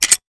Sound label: weapon foley pickup; Real Guns Sound Pack 2; Mono